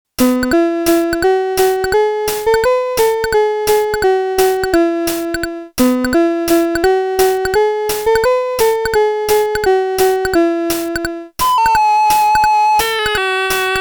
Casio VL1

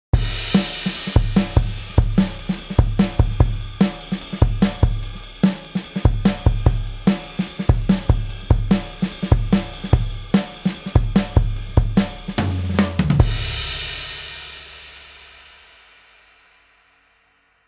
Gravity VCA drums; Tierra Audio

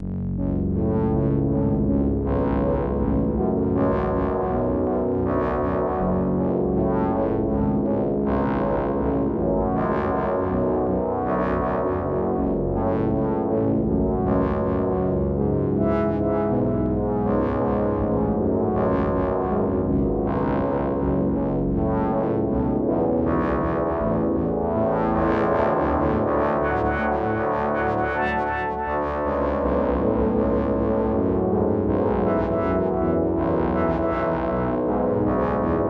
Turbine plus Osc Sync; Newfangled Generate

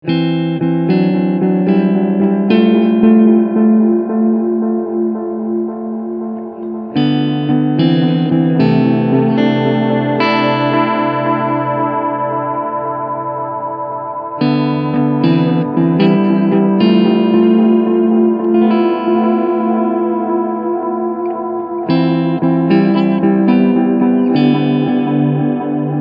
Echosex Max Delay

